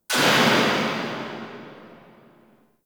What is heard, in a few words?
Air Pistol 2D Tail; Crp Air Pistol